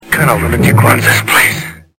lunatic

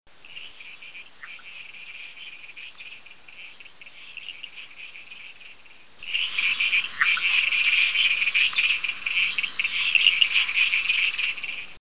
frog

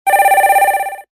phone new

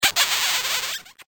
kiss

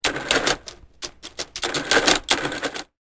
add machine

